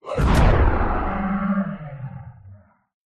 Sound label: horror monster creature impact growl squeal